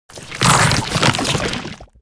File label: Corrupted Egg Death